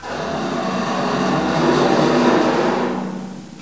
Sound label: creak